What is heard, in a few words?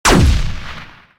revolver; pixel gun 3d